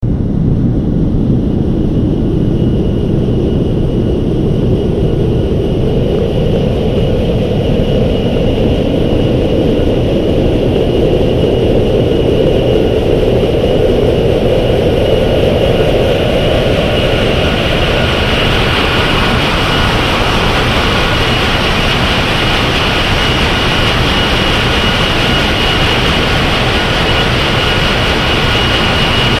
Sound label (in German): Maschinen Intro